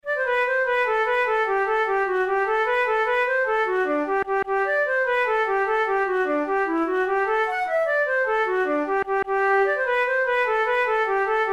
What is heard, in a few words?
jig, flute